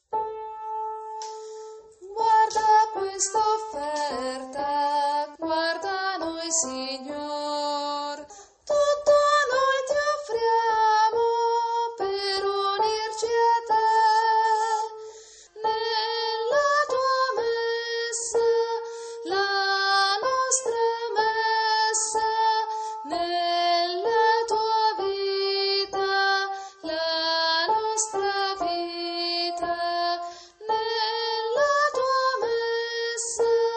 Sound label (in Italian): soprani